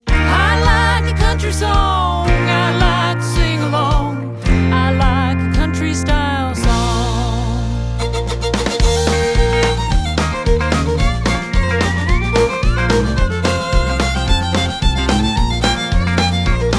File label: Country Style Music